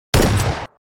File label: shot; ZombieBattle